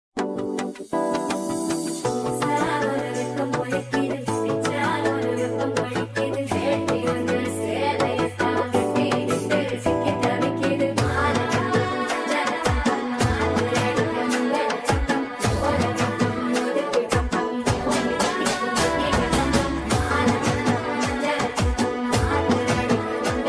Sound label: traditional mashup music ringtone lofi